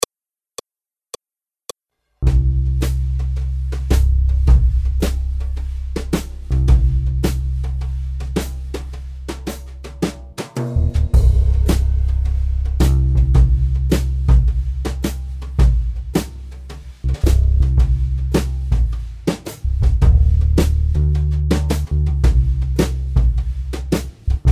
Post-morning blues Accompagnement seul

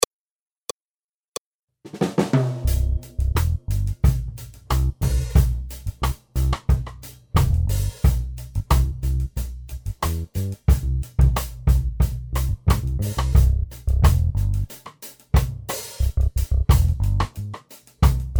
Reggae, Accompagnement seul; Jazz

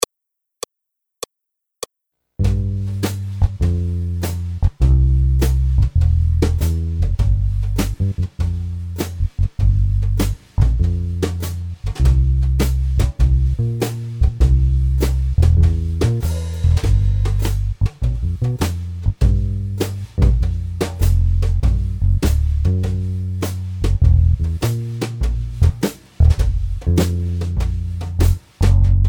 blues, Accompagnement seul

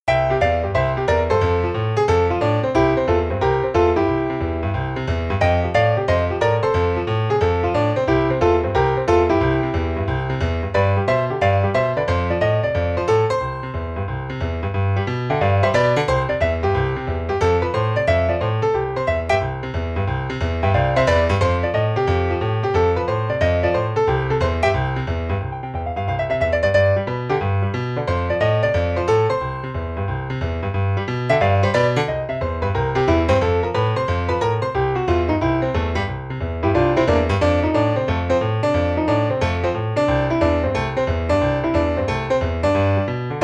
boogie Piano seul; Jazz

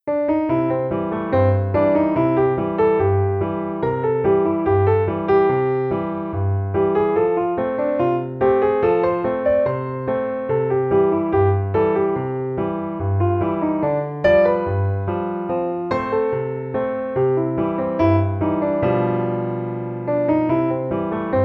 rag Piano seul; Jazz

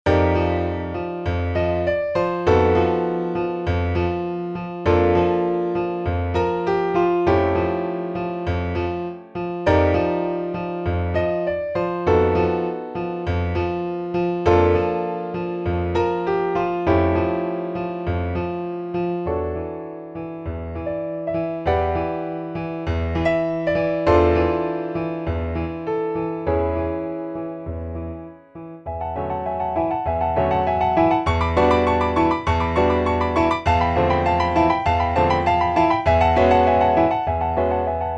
Pedal point Piano seul; Jazz

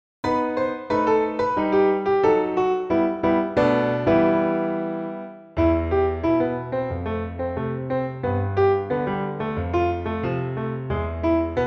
Syncopation Piano seul; Jazz

